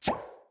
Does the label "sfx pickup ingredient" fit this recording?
Yes